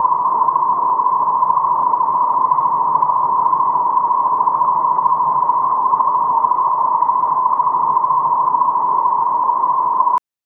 deep sea submarine sonar; cinematic

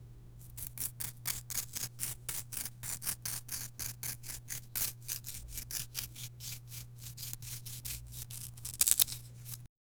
slow slicing through a la, blade

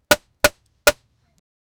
the crack of a baseball

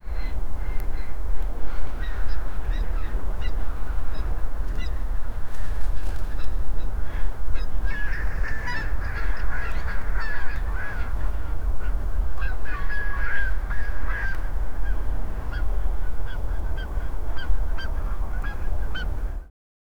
ocean with snow, arctic

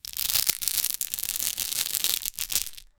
wrapping yourself in band

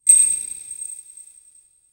metallic coin flip with e; sci-fi

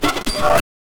sci-fi workbench work; workshop

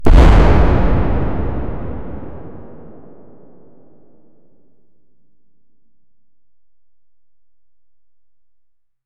red wooden barrel explosi

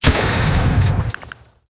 magical stone projectile

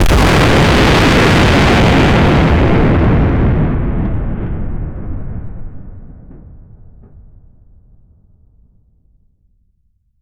blow up explosions, detonation

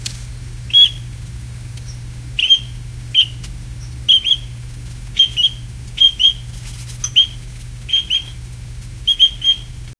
pelican, bird

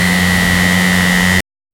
toyota supra turbo sound; automobiles

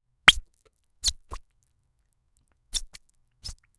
splash splash squish squi

